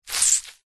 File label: plastic cellophane thin wet squeeze squelch; foley